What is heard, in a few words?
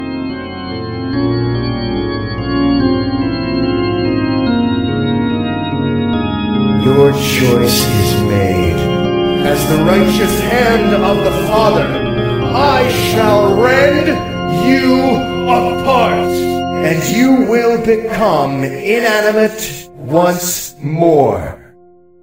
intro music; ff2